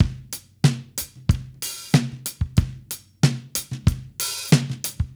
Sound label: Mixed Berry Jam Drums, 70s Analog Funk; Sonic Foundry (Sony Creative Software) - What it Is! 70s Analog Funk